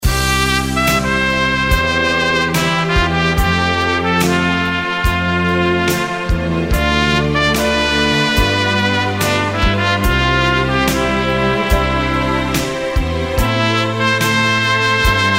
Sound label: trompet